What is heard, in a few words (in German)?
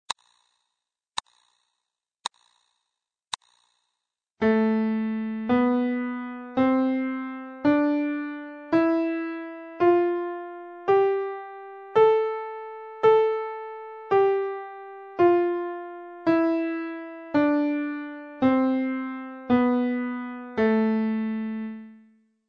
die reine moll tonleiter